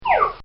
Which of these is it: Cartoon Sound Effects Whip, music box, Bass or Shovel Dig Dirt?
Cartoon Sound Effects Whip